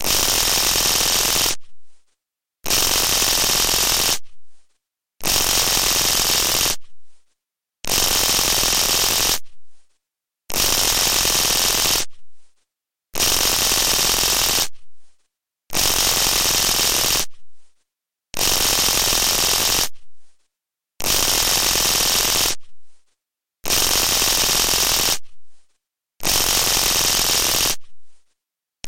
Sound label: Taser Alarm